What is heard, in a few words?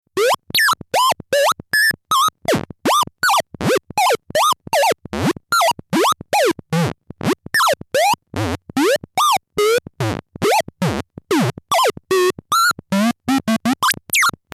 bleep tone